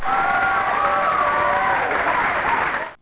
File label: crowd; Midway Games; Smash TV